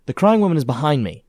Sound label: crying behind; blindside